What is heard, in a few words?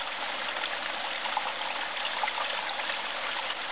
Fountain; CAESAR3